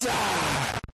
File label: distorted scream medium jumpscare layer creepy horror